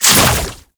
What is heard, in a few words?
Entangle Cast; Warframe; Oraxia